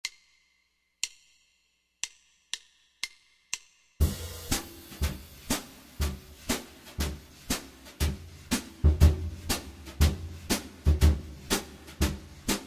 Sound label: Ooh Bop Ba Dah q120